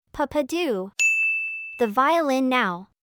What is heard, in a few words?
violin